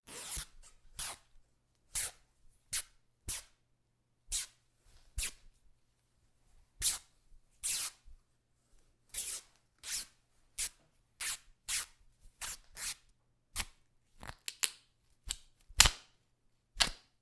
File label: sheet rip thin series